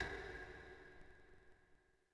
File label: Space Tap; Ambient; Sid Meier's Civilization 4 Complete; Beyond the Sword